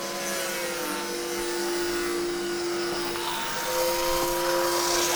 Wood Industry Tools Mid Ambient Noise Saw

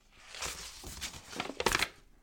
Opening Note

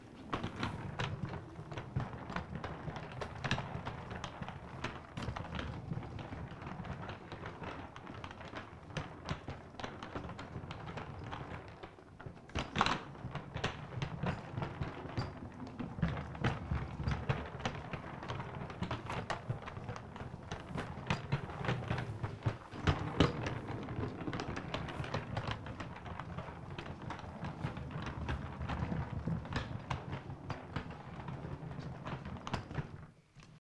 Luggage Wheels

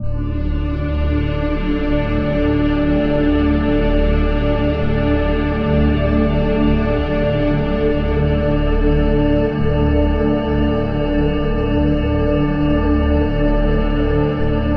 Drone All Drones D

